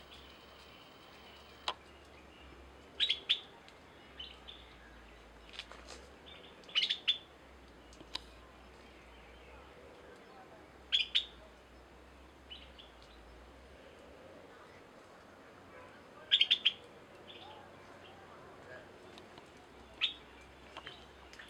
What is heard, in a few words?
Yellow throated bulbul call; gingee fort